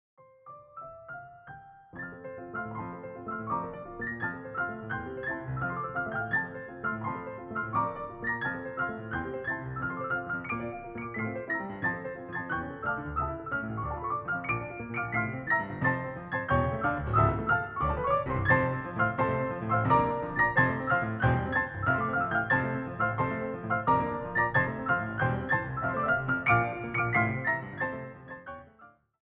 Hungarian Dance in F Minor- Poco sostenuto – Vivace; brahms; 21 hungarian dances; WoO 1